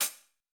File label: hihat; japanese pop